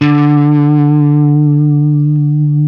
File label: Les Paul, guitar; Spectrasonics; Hans Zimmer Guitars VOL-1